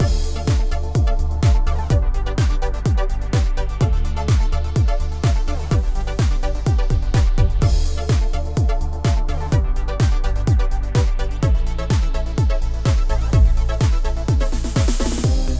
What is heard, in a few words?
technotronic